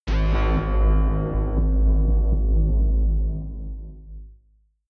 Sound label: blop bass